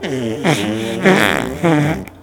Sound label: fart; TF2Maps; dovahkiw